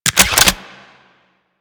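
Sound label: Primary crossbow Reload Clip Out; Warframe; Tenno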